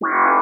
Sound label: missile